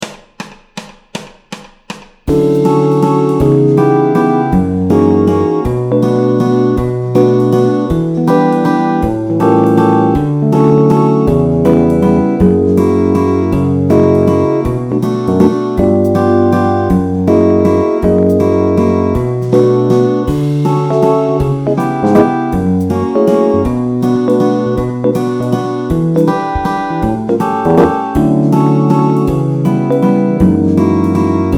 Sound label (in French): sans basse ni thème